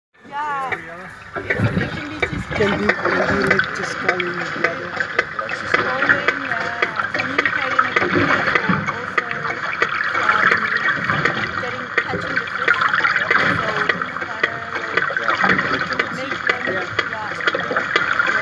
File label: Sperm Whales Hydro